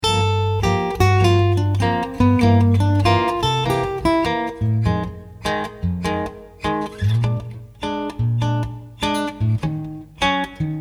Lick; 37 II V in 5ths starting on Gm7 (lick 1)

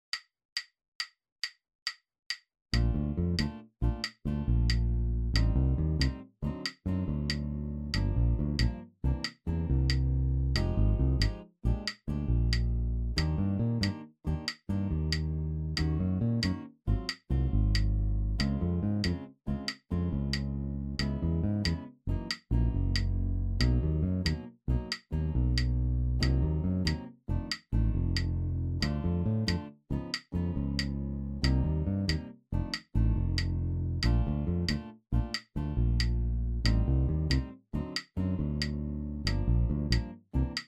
bass in 3

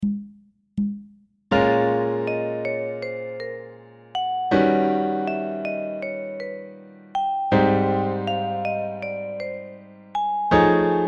II V I VI, b13, sharp9 on dom(Ab).; Pattern starting on F; II V licks on II-V cadence possibillities

